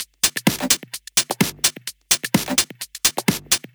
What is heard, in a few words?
Drumloops 128BPM